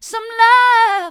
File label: mid, vocal